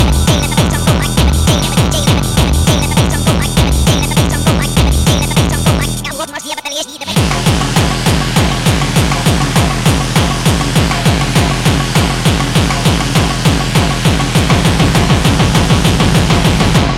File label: Let The Bassdrum Go 33 RPM